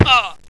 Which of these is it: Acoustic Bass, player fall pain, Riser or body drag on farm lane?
player fall pain